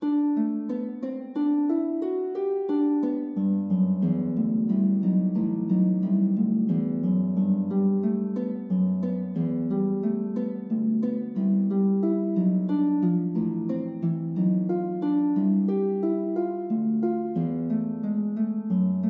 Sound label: courante, lute